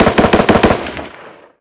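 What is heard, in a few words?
gun m16 medium; ModernWarfare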